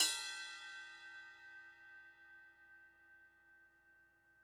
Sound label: Crash Hhxplosion 18 Bell